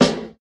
fat acoustic snare sample b key, snare sound tuned to the B note